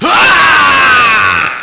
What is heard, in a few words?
huahh